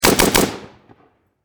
Warfare Machine Gun Bren Lmg Triple Shot Close Perspective